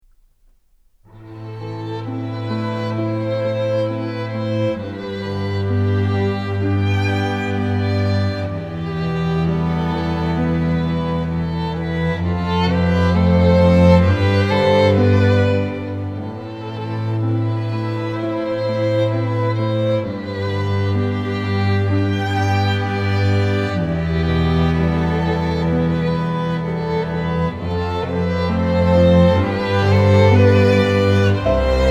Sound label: STRING QUARTET